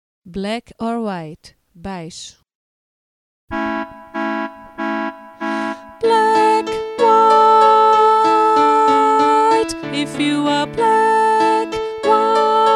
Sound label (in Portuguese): naipe baixo